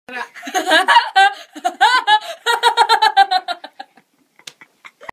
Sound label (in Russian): смех ржач; дятел вуди